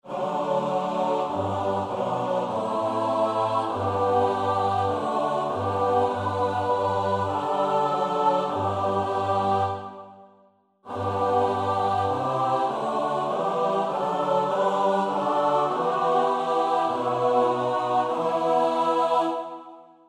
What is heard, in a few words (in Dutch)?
S-A-T-B; Nieuw Liedboek; NLB 85